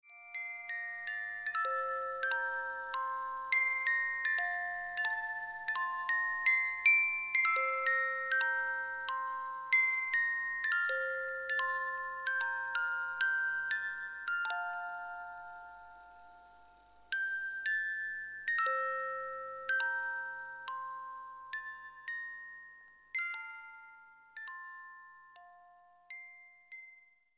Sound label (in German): spieluhr